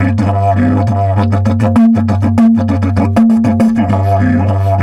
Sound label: DIDGE 100BPM, Didgeridoo; Big Fish Audio - Didgeridoo and Other Primitive Instruments